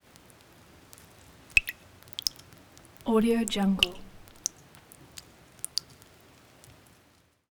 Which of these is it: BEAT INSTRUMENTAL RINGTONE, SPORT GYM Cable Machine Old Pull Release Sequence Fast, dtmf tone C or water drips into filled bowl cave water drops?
water drips into filled bowl cave water drops